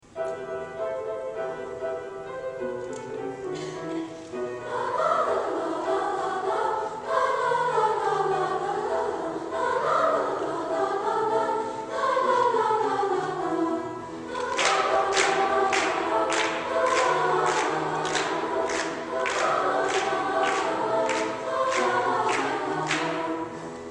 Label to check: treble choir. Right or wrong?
Right